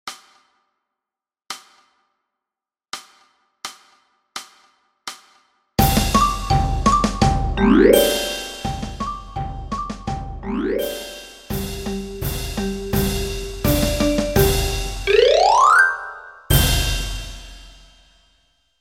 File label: 84 bpm, percu; Dans ce potiron intro